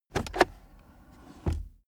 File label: car accessories center console drawer open